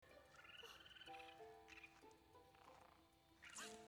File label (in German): Ruf Rex